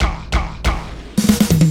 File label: Fills, Loops 140 BPM